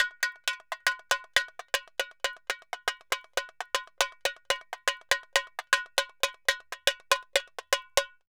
Tamborin Samba 120, Drums & Percussion; Best Service; Festa Latina VOL-1

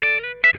LICK, Funk Guitar; 90 s Sample CDs; Zero G

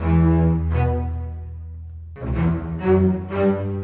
Bass Cello; Sonic Foundry (Sony Creative Software); Orchestral Series VOL-4; Rock and Pop; Rock-Pop 19

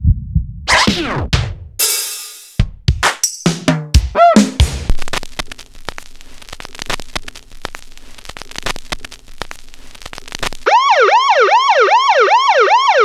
Korg M1, Samples Sliced; m8

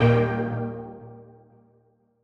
Old Skool D#2

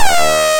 FIX SYNC, ARP Synths

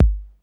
BD; Crypton - DrumBase5000

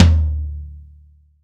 ROCK LO-TOM

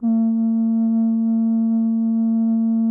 BAS B, GEMSHORN; SoundBytes - Early Patches

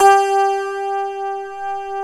HRP RCDR&FLT

